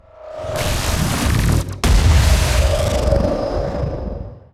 Bullet Shower

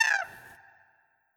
Vulture

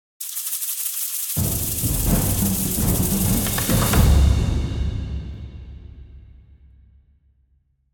Combat Transition; Doukutsu

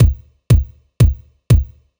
bassdrum 120bpm

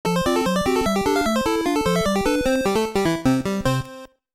Course Clear; Super Mario Bros 2

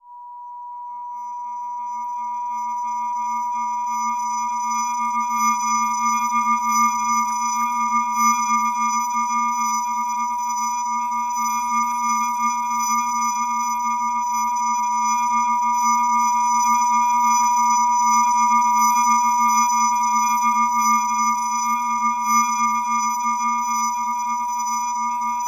Ambience, Sci Fi; Frogsong

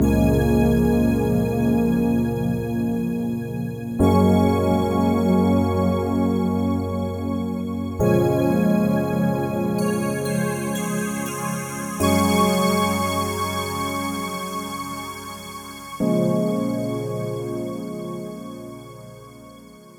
Flight Chords 120

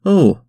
Chorus voice

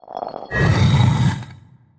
Swipe; Legend of Keepers; Leure Swipe

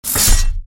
Sword over

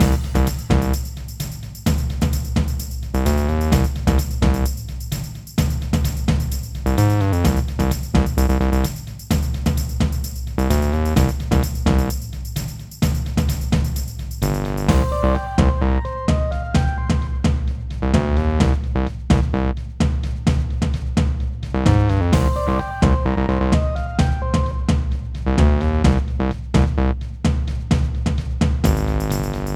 Forest Loop

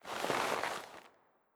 Hit Summon monster

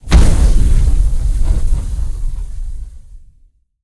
Fire Burst

